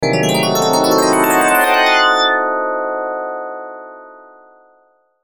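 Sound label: Musical Element, Drum Snare March; Blastwave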